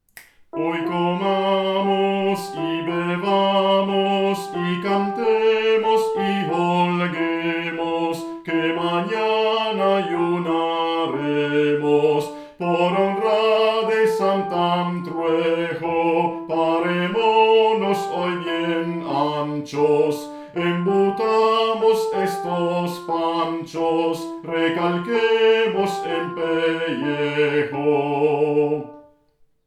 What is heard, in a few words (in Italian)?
HOY COMAMOS Y BEBAMOS Soprani